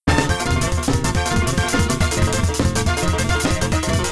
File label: Jazz ola, speed 2.00; tsmtoolbox